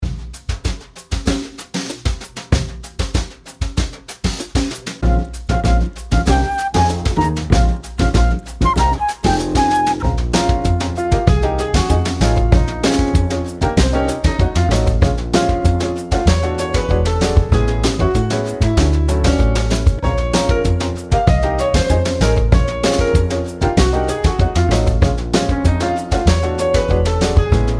Latin Jazz Time latin jazz

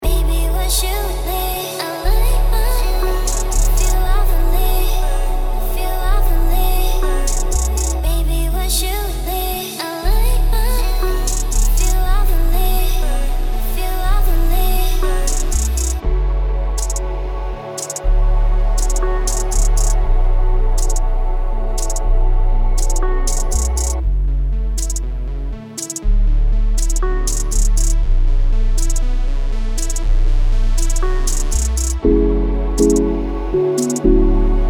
TRAP chill pop songs

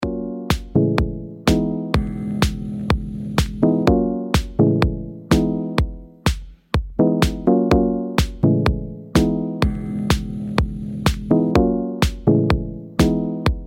elektro steps

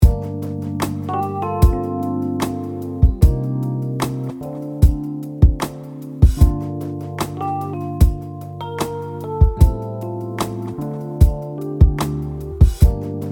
Candy Box sax

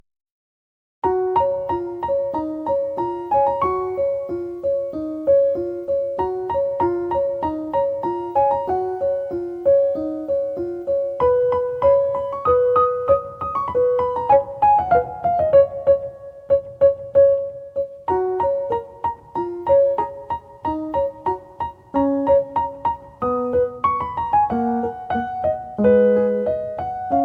Piano; Soundtrax Piano collection

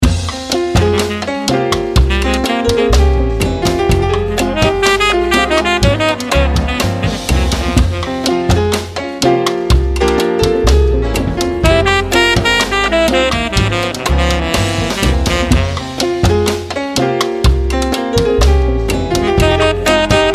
latino radio

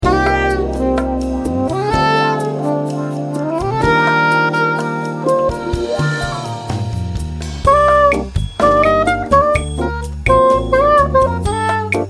chillout fluo hats; JAZZ

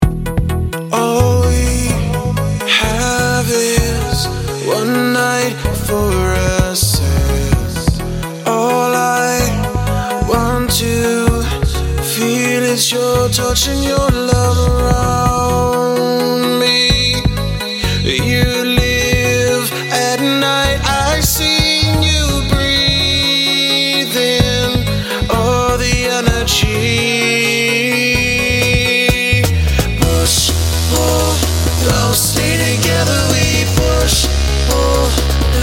Energy Pop

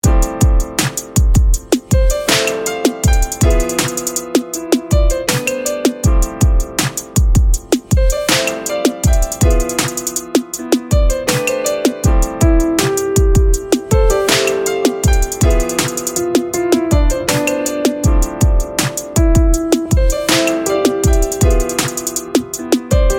Cool Mix

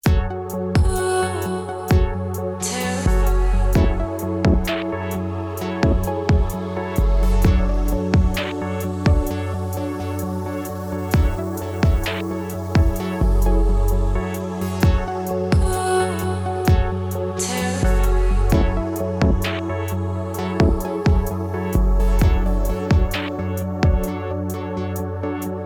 Soundtrax Grooves electro lounge

